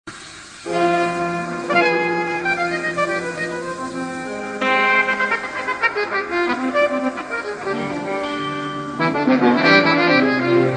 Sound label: the whirling strangled drone of electric bagp; AI Test Kitchen